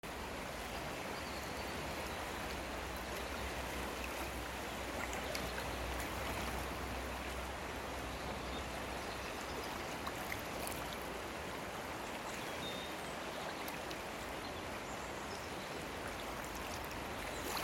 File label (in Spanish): sonido rio sella